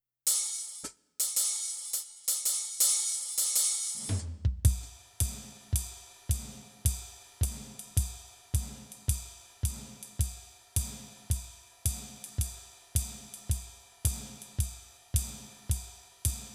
Drums

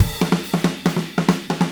Drums Rock Fill, loops; Alex Sanfilippo

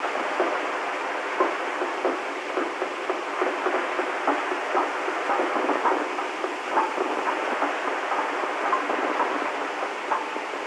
90 Field Texture; Downtempo; Drums- Downtempo Loops & Samples; RootOfUnity

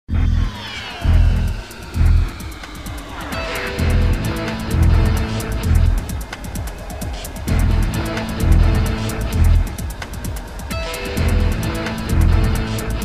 Impressionist, Music background pads

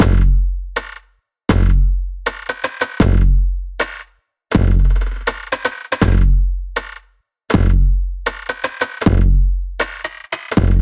Tuning Fork 80 Perc; Aerosol

